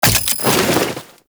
Turret Throw; Warframe; Corpus; Corpus Railjack; Crp Admiral Agent

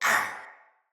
Pain Soldier Male; Sinecure Soldier Male B

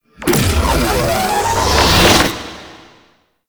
Drop Beam Charge Up; Enemies; Weapons; Warframe; Tau; Sentient Dactolyst

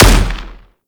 Anti Aircraft Gun Shot; Warframe; Weapons; Archwing

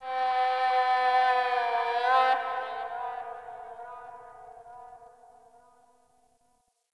distant creature; Ambience; Warframe; Eidolon Plains

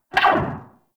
Pulse Laser Tail; Warframe; Railjack; RailJack Weapons

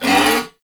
Ram Pain; Animals; Duviri

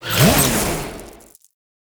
Power Slide Start, Motorcycle; Warframe; Motorcycle Ninety Nine

